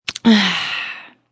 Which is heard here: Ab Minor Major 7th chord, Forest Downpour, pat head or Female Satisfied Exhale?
Female Satisfied Exhale